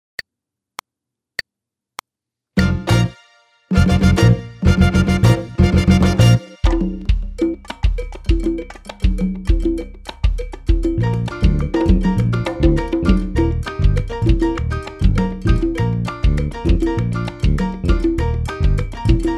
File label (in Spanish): mambo pista